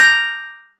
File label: complete